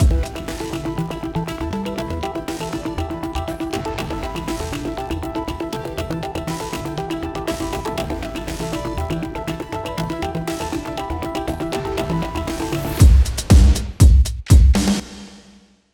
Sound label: Remix Intro; Hero Outlander